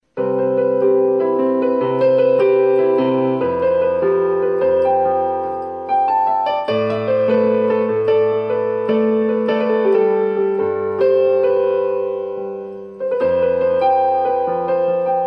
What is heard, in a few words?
Piano improvisation